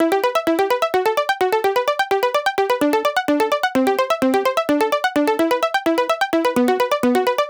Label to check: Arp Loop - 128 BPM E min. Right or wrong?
Right